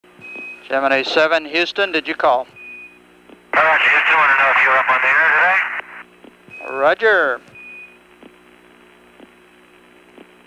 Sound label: Jingle Bells; Gemini 7